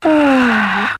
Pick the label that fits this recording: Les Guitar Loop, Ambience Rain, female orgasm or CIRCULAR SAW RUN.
female orgasm